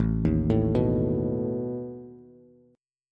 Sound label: A Suspended 2nd Flat 5th A down Bass; Asus2b5 arpeggiated